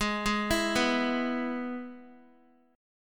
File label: Ab Minor Sharp 5th Ab down Guitar; Abm#5; arpeggiated